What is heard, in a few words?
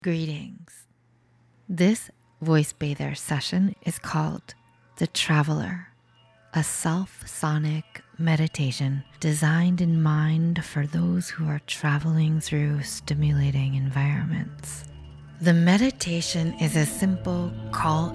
voice bath